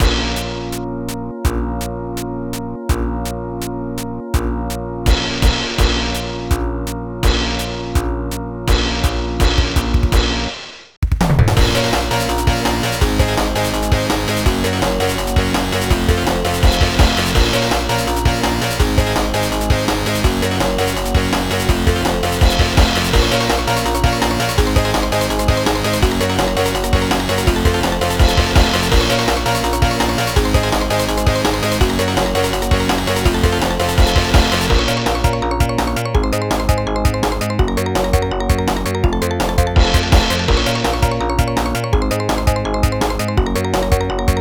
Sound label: I Am God (Instrumental); Friday Night Funkin' Vs. OG Sonic.exe